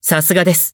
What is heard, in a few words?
Throw Break; BBCF; Hibiki Kohaku